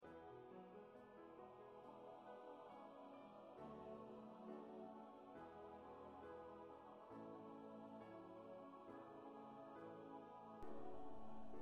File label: Choir 2 Soprano